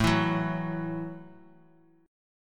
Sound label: A 5th 6th A, guitar chord; A56 Chord {5 7 4 X X X} strummed